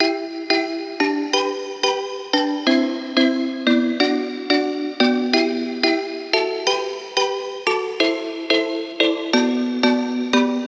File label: 90 synth pluck Em